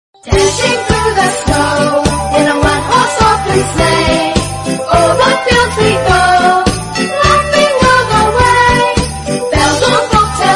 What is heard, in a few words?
Jingle Bells Holiday Ringtone; ringtones